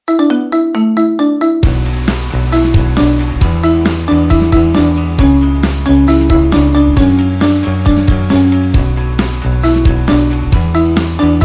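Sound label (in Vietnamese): nhạc chuông điện thoại apple iphone 12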